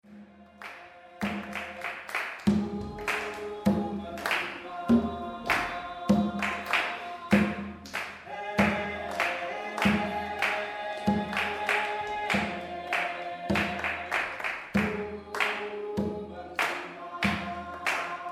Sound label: rhythm extract